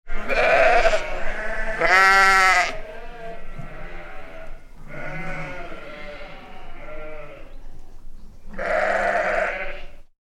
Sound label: animals sheep bleating many, Sheep Bleating (Herd); McKinney Sound